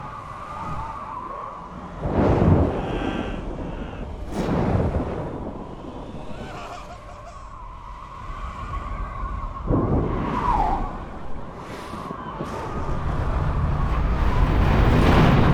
Ambient; dante s inferno; x360

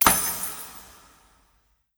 miniheist send button; this is the president

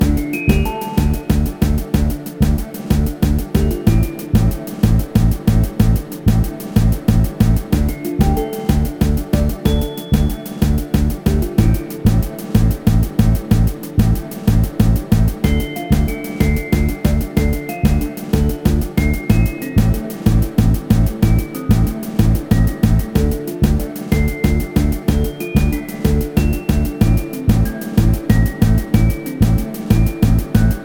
music loop; Secrets of Arcadia

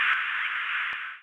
Short static

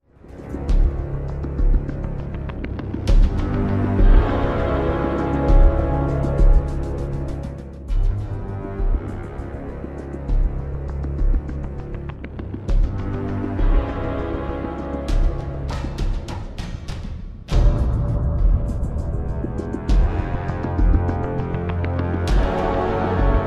Suspense Theme; The Amazing Spider-Man; PS Vita, PS3, Windows, Xbox 360

